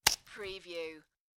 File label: Snapping a Prawn Cracker; beanstalk audio